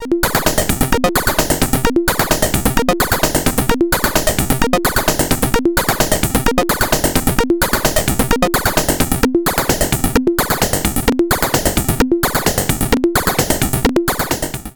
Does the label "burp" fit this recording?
No